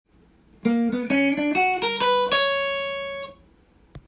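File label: B minor melody, guitar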